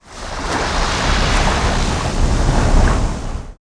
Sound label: wtr flow; Comanche